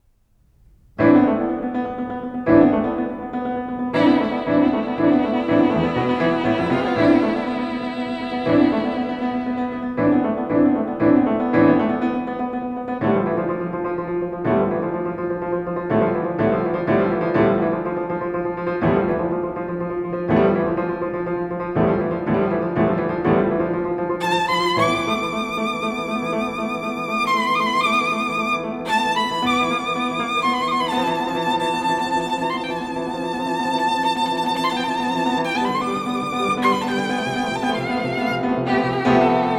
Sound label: Popular Spanish Suite IV. Polo for Violin and Piano; Arr. Kochanski for Violin and Piano After Falla's Canciones